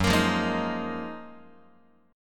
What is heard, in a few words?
Gb Major 13th Gb down Guitar; GbM13 strummed; JGuitar